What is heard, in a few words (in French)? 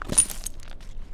Verre Seul